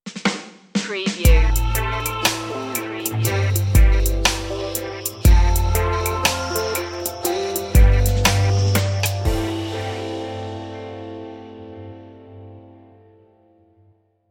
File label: dark fashion